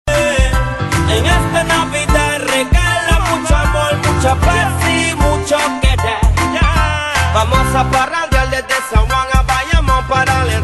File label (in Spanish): Jingle De Navidad (Pa Reggaeton)